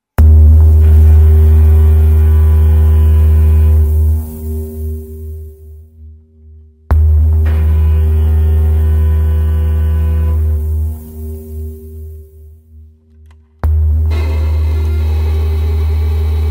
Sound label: crushed quartet fragment